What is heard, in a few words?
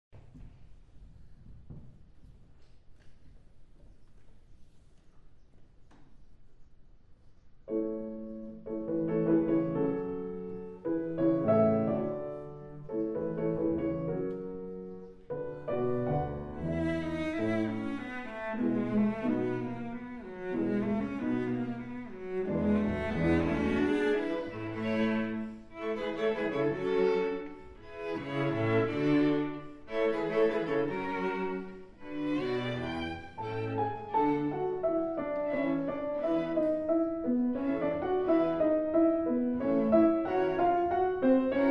piano quartet; brahms; Cuarteto para piano, Op. 26